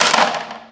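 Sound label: physics clipboard impact medium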